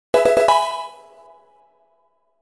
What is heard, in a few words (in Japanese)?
レベルアップ！; Pokémon HeartGold & SoulSilver